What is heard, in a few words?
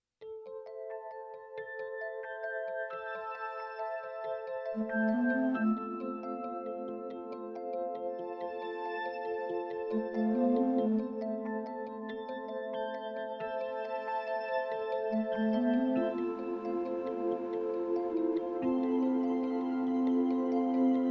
world music